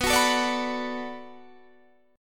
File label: D 6th B, chord